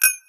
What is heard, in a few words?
Single UI Beep